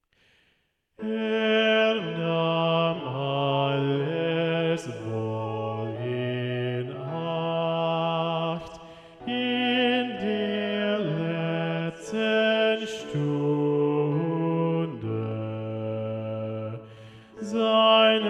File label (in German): Er nahm alles Bass